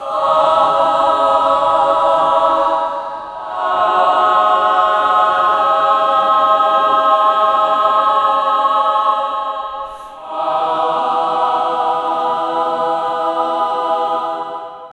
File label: choir of a thousand cursi, human voice